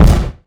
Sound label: catnap footstep; zoochosis pack; nightmare catnap